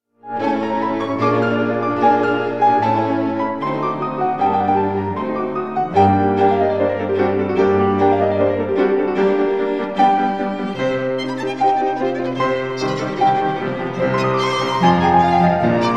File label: quartet in C major for piano and strings